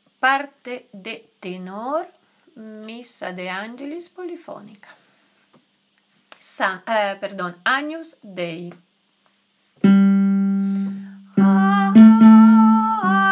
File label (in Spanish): Agnus tenor